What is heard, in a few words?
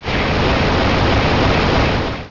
Hydro Cannon; pokerogue; battle anims